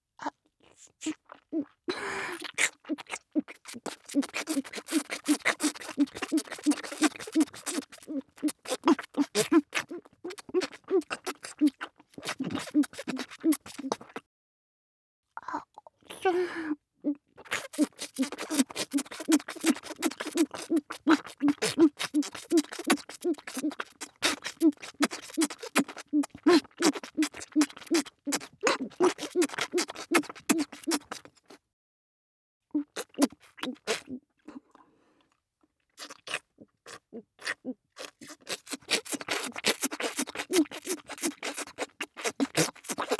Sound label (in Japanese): ピストンフェラ; ピンクサロンでフェラチオづくし